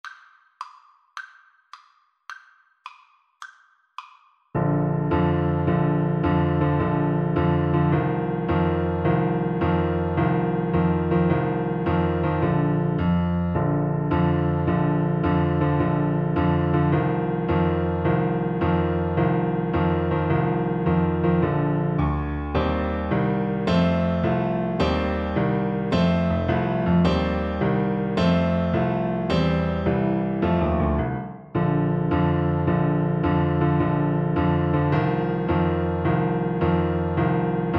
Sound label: mexican hat dance, Tuba